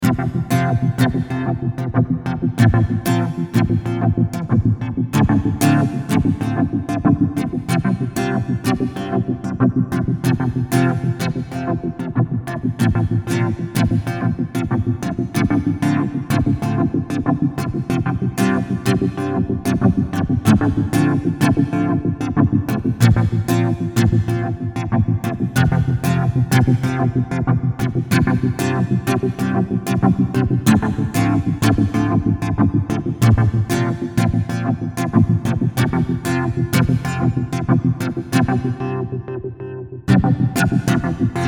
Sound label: Melody Practice